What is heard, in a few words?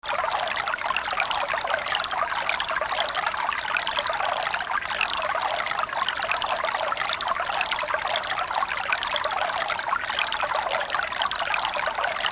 stream